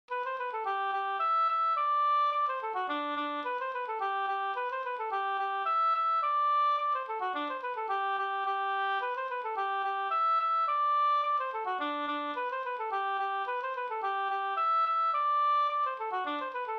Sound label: polka; e Oskar i Bogsta Sd